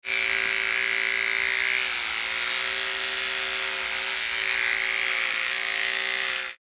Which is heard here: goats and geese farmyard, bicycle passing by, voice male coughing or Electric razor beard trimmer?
Electric razor beard trimmer